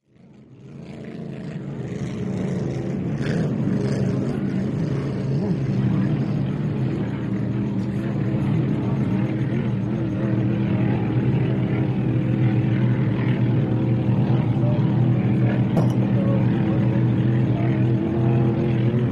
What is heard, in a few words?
Boat Racing Amb, motorboats